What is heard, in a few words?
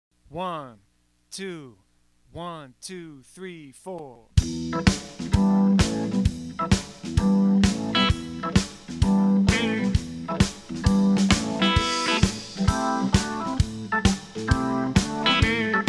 Quarter Notes